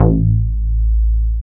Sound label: BASS